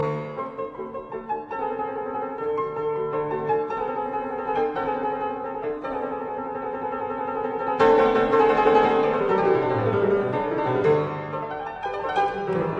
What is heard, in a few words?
Piano Sonata in A flat major, Menuetto capriccioso Presto assai; Op 39; Trio